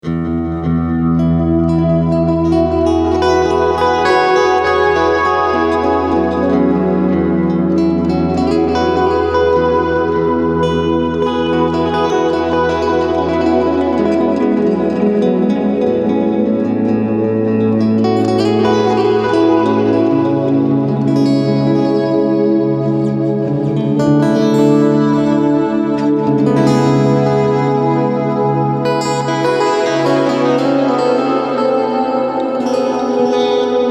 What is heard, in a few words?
Ambient